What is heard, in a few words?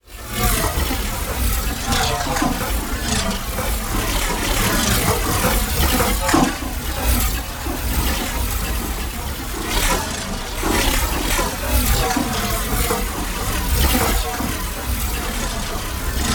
PARTICLES LARGE FAST Clunky Metal Madness; NEON; boom library